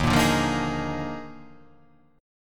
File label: Eb Major 7th Suspended 2nd Suspended 4th Eb; strummed; x,6,8,7,5,5,5,5; EbM7sus2sus4